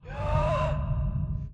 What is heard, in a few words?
groan